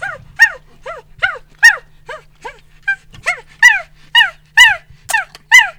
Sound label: monkey; E-MU Producer Series Vol. 3 – Hollywood Sound Effects; Water